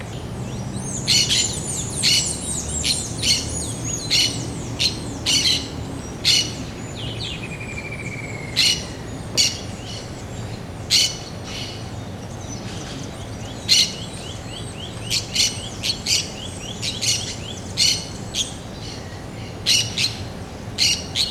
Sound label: Brotogeris sanctihomae; Manaus